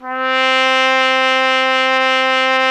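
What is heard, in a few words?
brass trumpet C; Miroslav Vitous; Solo Instruments VOL-1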